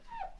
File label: Cleaning Window